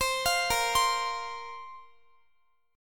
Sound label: Bb Suspended 2nd Bb down Guitar; Bbsus2 arpeggiated